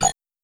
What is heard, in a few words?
fail